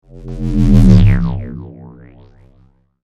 Synth Stab Delay, Pass, By; Synth, Stab, Delay, Pass, By, 5.1; Sound Effects:Science Fiction; BlastWave

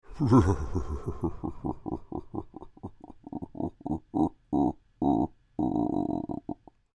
Creature Devilish Laugh Lite, Human; Horror; Evil Laughs

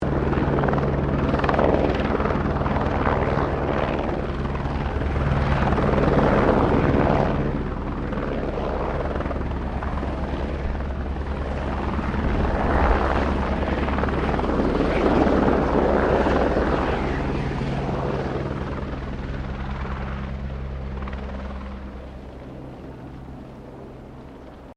helicopter Bell 204 firefighter passing medium; Helicopters; Vehicles; Aircraft Collection

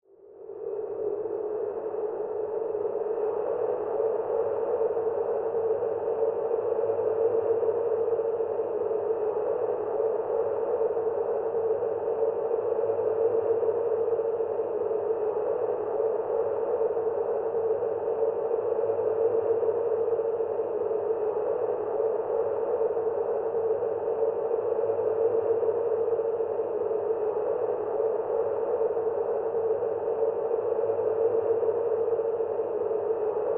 Wind Drone Steady, Horror, Wind, Drone, Steady; Scary Soundscape; BlastWave

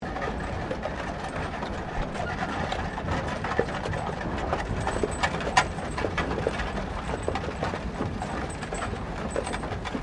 Wagon Horsedrawn 4 Horse Slow Wheels Loop; Carriages; Vehicles

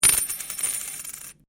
Coin Penny Single Spin Laminate; Common coins; Money; Objects

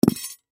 Scissors Drop, Scissors Drop Thud Carpet; Offices; Blastwave; Foley

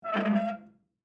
Safe Door Open, Office, Large, Metal, Squeak; Metal Doors; BlastWave

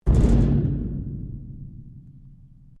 Strike Metal Hollow Tank Rattle; Materials

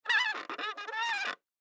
Drum Tune, Drum Tune Turn Key Squeak; Drum Tune Turn Key Slow Squeak; Drum Kit Sound Effects; Blastwave

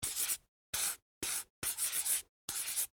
Office Marker Write On Cardboard; Writing; Human

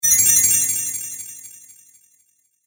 Fantasy Fx Healing Spell; Magic Sound Effects; Andrew Fleming

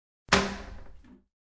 Toilet Lid Close, Toilet lid falling shut; Bathroom Sound Effects; Sound Effects:Household

